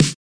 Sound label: melbourne bounce snare small; Hip Hop; STYLE KITS; D3ron Melbourne Bounce Kit